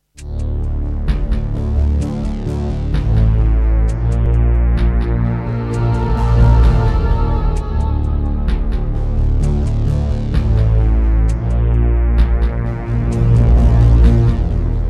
Tascam Pocketstudio 5; Descent II

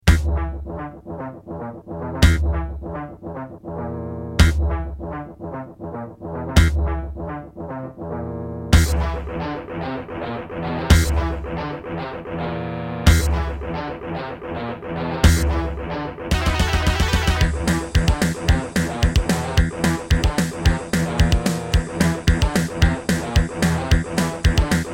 Creative Midi Blaster MB10; Doom

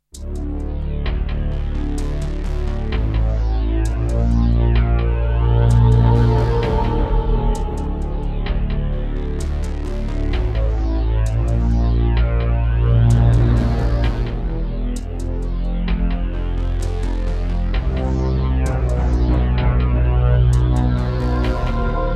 Roland SC-7; Descent II

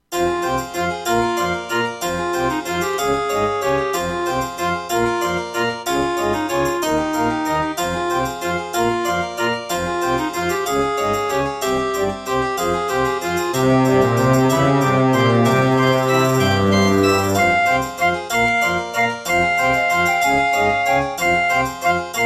Track 6, Hammond GM-1000; Death Gate

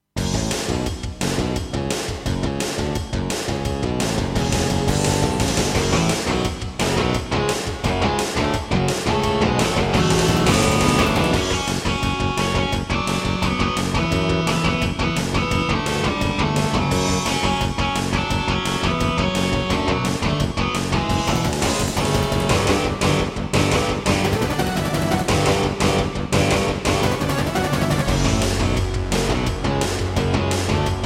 Intro, Trust Korg Super Sound Module; Duke Nukem 3D

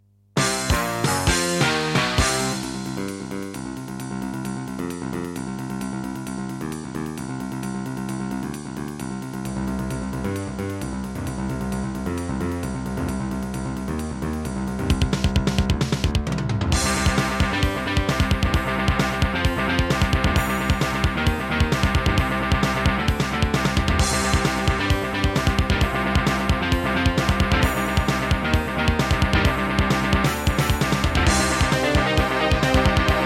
Diamond Monster Sound 2MB; sigil doom episode 5

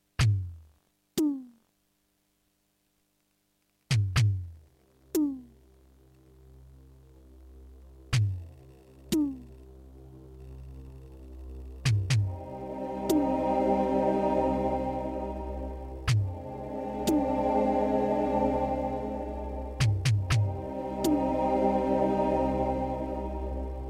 Terratec MiniWaveSystem SOWT-11; Doom - E1M8

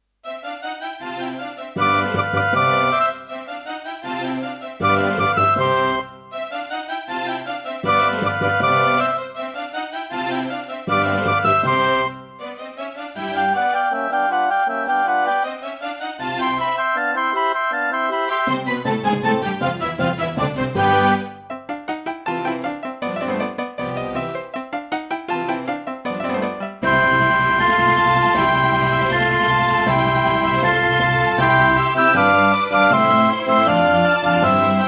Roland SC-88 Pro; 88MAP; classical; Mozart; Concerto K467 partial

